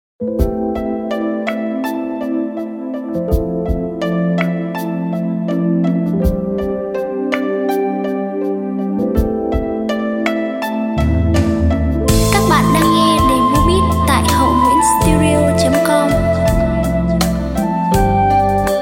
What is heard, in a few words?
beat, playback